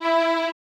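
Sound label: Vibraphone E3 L, AKAI Advance Orchestra; AKAI Advance Orchestra Upgrade 97 Vol.1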